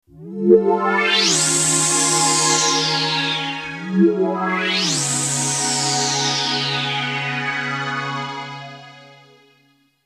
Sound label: techno